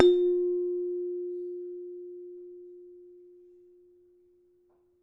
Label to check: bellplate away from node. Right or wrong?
Right